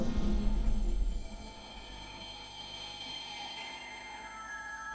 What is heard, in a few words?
footsteps